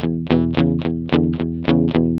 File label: ROCK E 110, Vintage Blues Guitar; USB Soundscan vol.22; AKAI; ROCK2